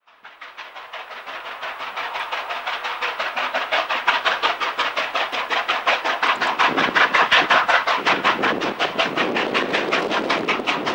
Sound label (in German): dampflok sound china